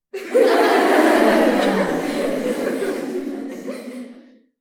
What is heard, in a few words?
classroom kids laughing; audiostew